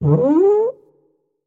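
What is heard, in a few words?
sounds of tuba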